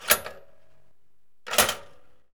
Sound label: TOAST, Kitchen; Sound & Vision; Gigapack II CD 2 (Roland)